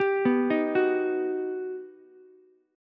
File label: G Augmented Major 7th, Ukulele; G+M7 arpeggiated; JGuitar